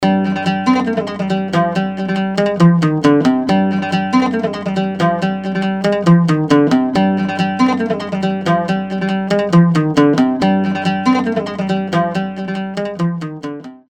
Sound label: Middle Eastern Oud; Apple GarageBand 2; Apple Loops